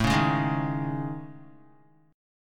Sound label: A Major 7th Flat 5th, Guitar, chord; AM7b5 strummed; JGuitar